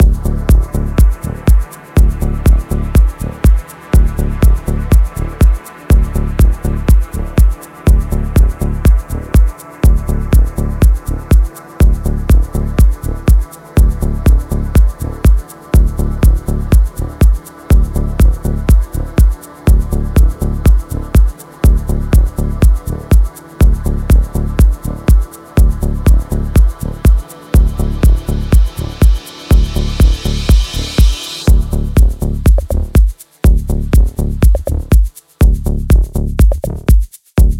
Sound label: Instrumental